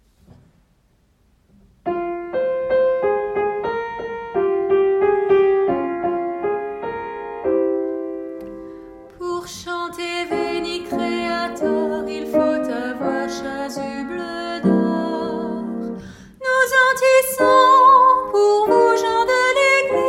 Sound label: alto; canuts